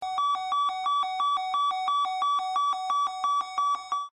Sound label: nz callout; active911